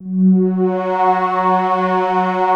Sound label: APPROACH, PADS; Masterbits; Vintage Voltage